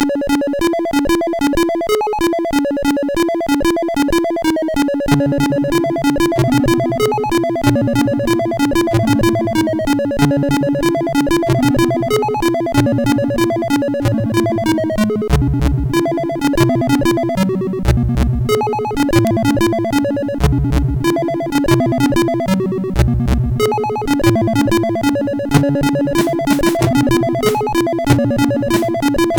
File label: Orchestral, CSG8580R5; hvsc